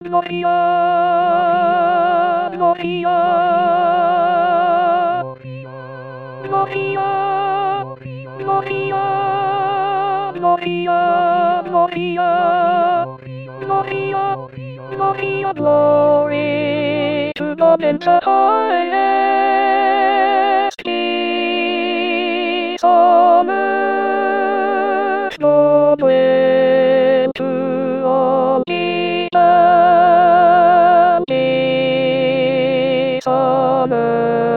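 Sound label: Christmas Fanfare, alto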